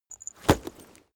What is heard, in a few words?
leather metal impact hard